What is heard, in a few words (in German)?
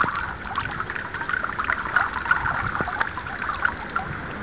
Brunnen; st martin; Memmingen